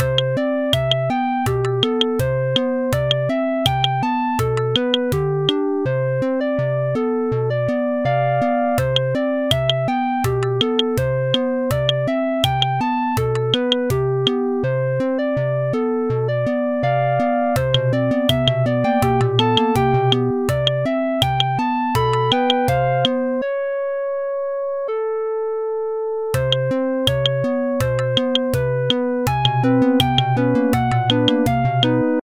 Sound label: Mall Ambient; Cruelty Squad